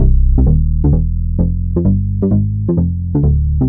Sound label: Bass 130C, uk garage 130bpm; Zona